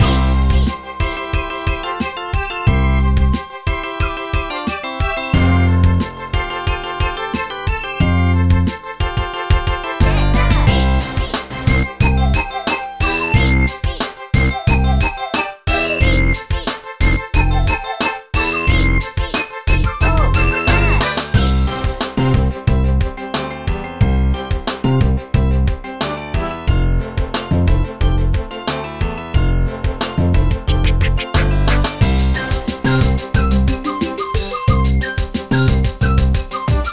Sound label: Music WORLDMAP; Super Scribblenauts 2010 NDS